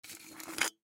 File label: Can Pick Up Dirt Aluminum Empty; Pick Ups; Foley; Blastwave